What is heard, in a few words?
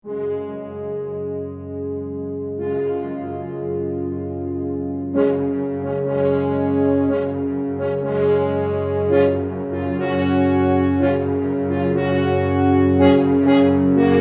F. Horns Sect; fantom-x8; patch demo